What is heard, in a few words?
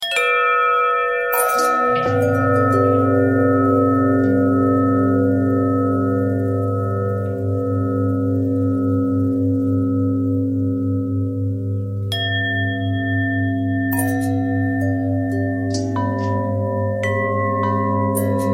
long bell bell harmonic twist, bells